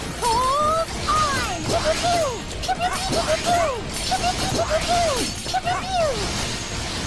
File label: pew pew pew